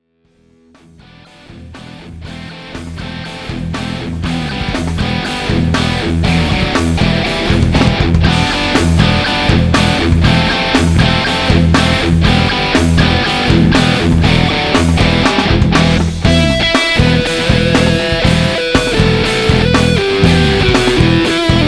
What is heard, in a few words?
AMP Modern Solo; Studio Devil Amp Modeller Pro v.1.0